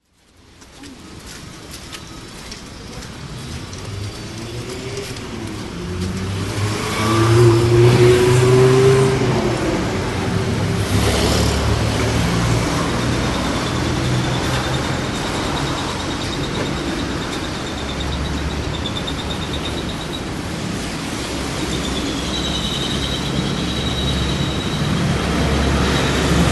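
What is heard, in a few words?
sounds of city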